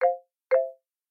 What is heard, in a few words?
hop